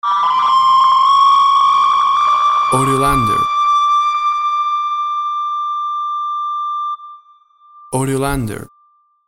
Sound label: Witch Scream High